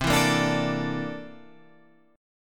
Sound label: C 13th C down Guitar Half Step Down